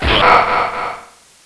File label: death; Alien Arena; firebrand